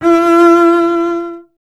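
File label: string contrabass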